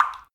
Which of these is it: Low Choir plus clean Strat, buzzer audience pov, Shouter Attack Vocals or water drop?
water drop